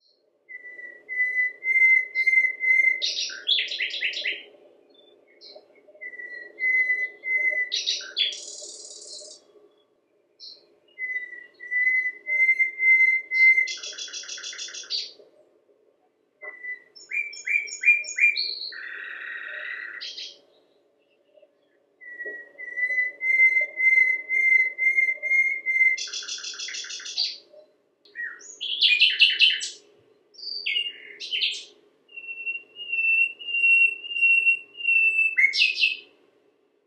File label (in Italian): usignolo; I 7 CANTI